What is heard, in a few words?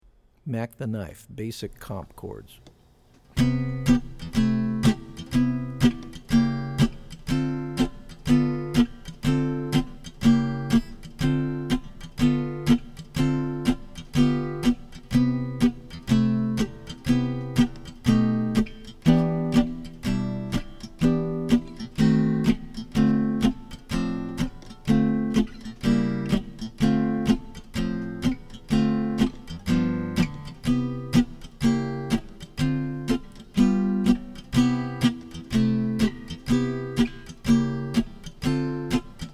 Basic, jazz tunes